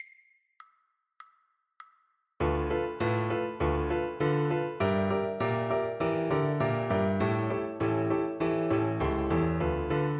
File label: stomp, viola